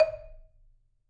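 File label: D#, Marimba, dead note; Sampleheads - Dave Samuels Marimba & Vibes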